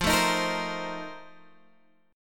F 11th F, down, Guitar Open F; F11 strummed

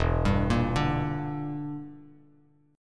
E Minor 7th Flat 5th E down Bass Standard; arpeggiated

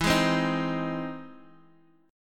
E Augmented Major 7th E down Bass Standard; E+M7 strummed; JGuitar

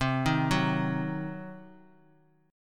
C Diminished C, Bass Standard; arpeggiated; JGuitar

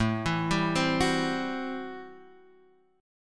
A-Minor 7th Add 6th, chord; Am7 Add6 arpeggiated; JGuitar

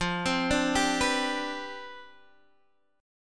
C Major 7th Suspended 2nd Suspended 4th F

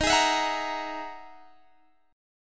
E-Major Sharp 11th Eb

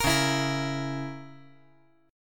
Db Minor 6th Db down; Dbm6 strummed; JGuitar

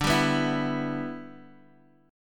G 6th D

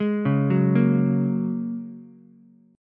Bb Minor 7th Sharp 5th Ukulele; arpeggiated; chord shape; 1,1,2,1

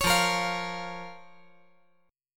F Suspended 4th Sharp 5th F

